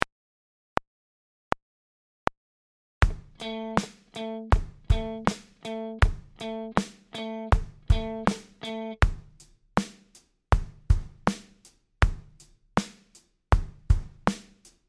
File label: groove